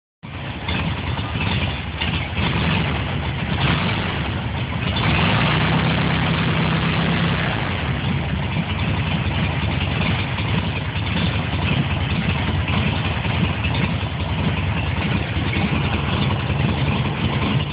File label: vw fusca 1961